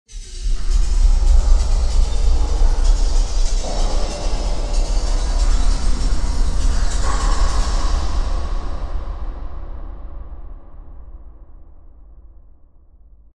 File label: Horror